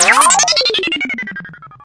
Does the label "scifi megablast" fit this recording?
Yes